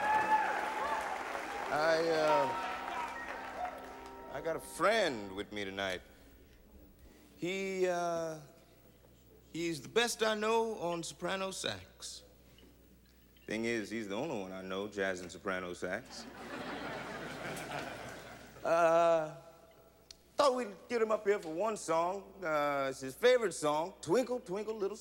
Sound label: sax man; Indiana Jones; Mystery of Jazz; Jonesy